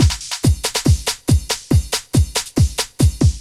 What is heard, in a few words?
techno 140